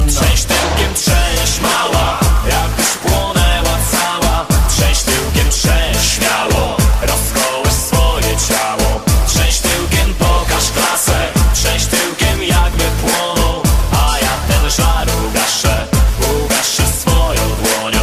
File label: Polish Disco